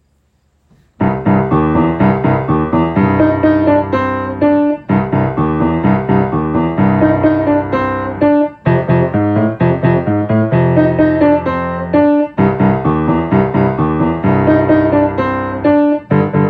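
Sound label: Broken Record Boogie, Piano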